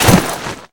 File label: bodyfall dirt sand